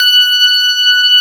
CLAV, FUNK FM CLAV

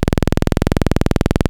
2600 E1, ARP 2600; Hollywood Edge - Giorgio Moroder Rare Synthesizer Collection

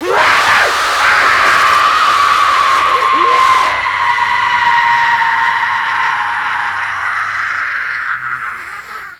Kaos FX, Ambient Grooves

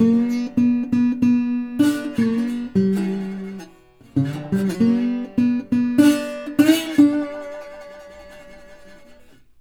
Blues Guitar Samples; ILIO - Fretworks

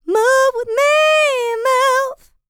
LICKS, Vocal R&B Dance; LT DANCE

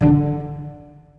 CL PZ D2, strings pizz; ILIO; Kirk Hunter; Virtuoso Strings

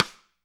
RIM MIX, AMBIENCE RIM

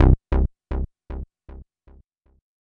C3, SynthLine

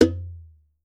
earth drum; Voice Crystal - Steve Reid Definitive Percussion

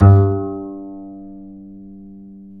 G 3, Bass; Spectrasonics; Bass Legends

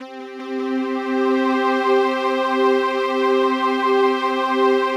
PAD, DIGI-PAD

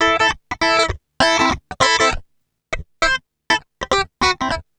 FUNK, FUNKY GTR

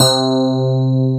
ELECTRIC PF, Piano; AKAI S6000 CD-ROM - Volume 1

